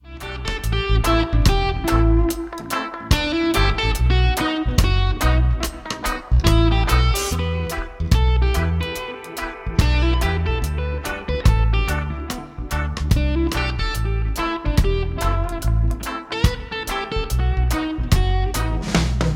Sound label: Reggae